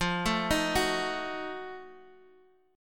F 7th Flat 9th F Guitar Standard; arpeggiated; chord; F7b9; 13,12,13,11,x,x; JGuitar

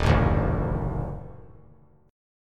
Eb Minor Major 7th Flat 5th Eb down; EbmM7b5; strummed; JGuitar

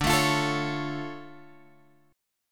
D Minor Major 7th, D, Guitar, Drop D

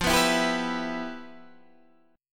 E Minor F, chord; Em/F strummed; JGuitar

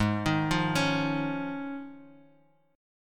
D sharp 7th Suspended 2nd Suspended 4th G sharp

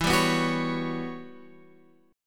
E Minor 6th Add 9th chord

